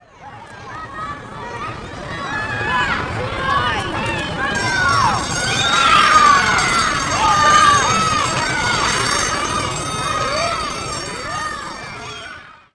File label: theme; Tetris Collection for Windows 98; marbles